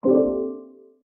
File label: Continue Arrow